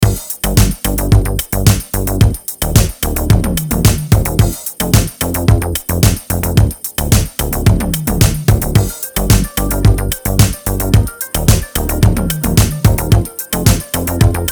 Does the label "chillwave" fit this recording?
Yes